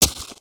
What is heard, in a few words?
Jungle Banana Smashable; gnarled forest